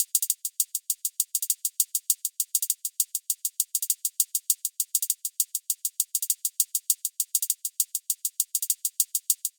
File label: 100bpm Loop Hh